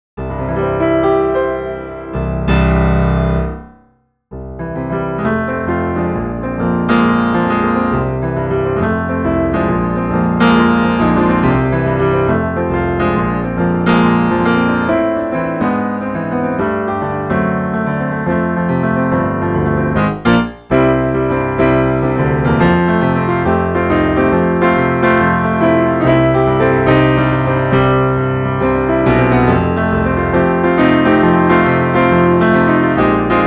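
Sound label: dynamic jazz 3-mic test, piano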